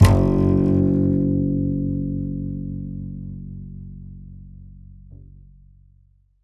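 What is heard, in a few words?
double bass F#2, Jazzistic; Univers Sons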